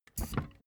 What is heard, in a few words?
office chair hydraulics down stepwise